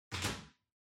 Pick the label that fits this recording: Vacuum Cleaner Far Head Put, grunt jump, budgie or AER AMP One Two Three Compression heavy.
Vacuum Cleaner Far Head Put